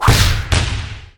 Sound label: Beach ball spike